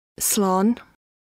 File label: Key Sounds